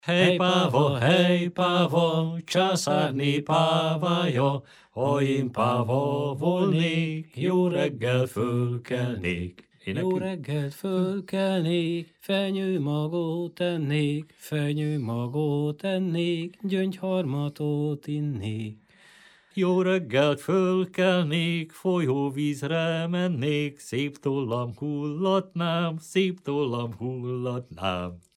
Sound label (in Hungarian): nepdal; hej páva